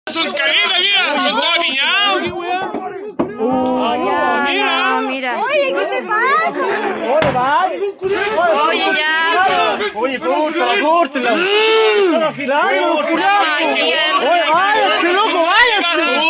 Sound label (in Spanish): sonido ambiente